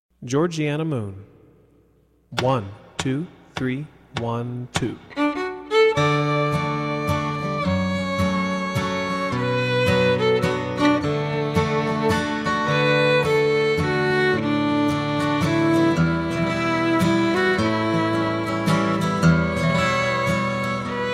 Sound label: Georgiana Moon, fiddle